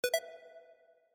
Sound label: message incoming